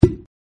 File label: pop cork